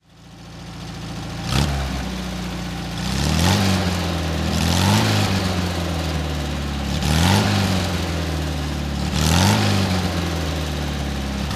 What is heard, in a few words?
VW beetle 1200 throttle, Engine sound; Volkswagen 1200 'Beetle', 1970; V3D/ ClassicarImages; Marc Vorgers